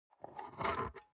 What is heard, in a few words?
object metal shell storage lid slide open soft